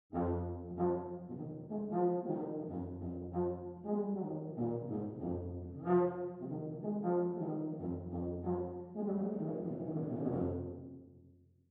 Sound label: Tuba SOLO